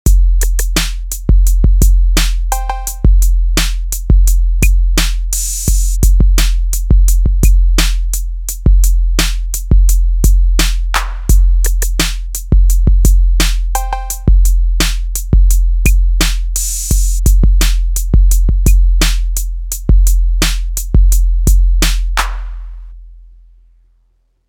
808 into mpc60

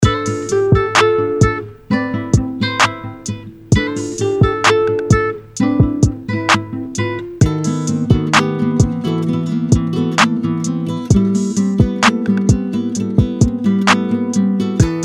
Acoustic Guitar Loop

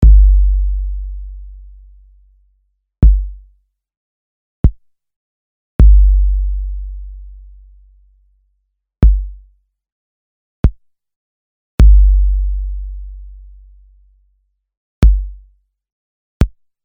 808s; First Dates

